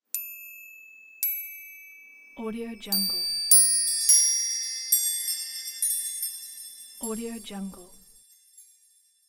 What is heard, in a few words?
cartoon gold bells